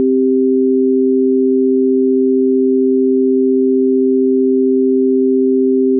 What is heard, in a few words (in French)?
sine